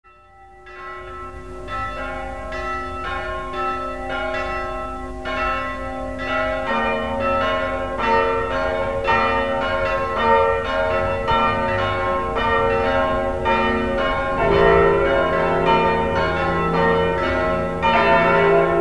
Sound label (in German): kirchenglocken ottakring